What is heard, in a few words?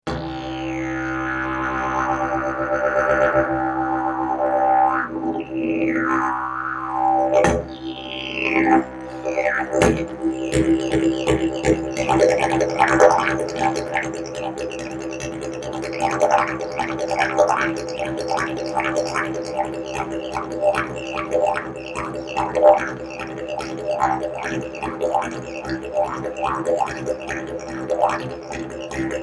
didge